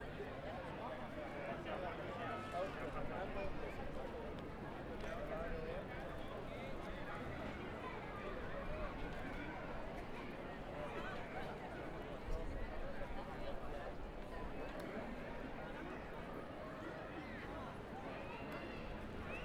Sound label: CROWD BUS STOP LOW; SIMUZENSOUND